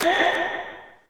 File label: zombie ghost footstep; ghostfury